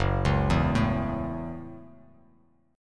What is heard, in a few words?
F sharp Minor 7th F sharp, Bass Standard; arpeggiated; JGuitar